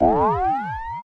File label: synth efx rise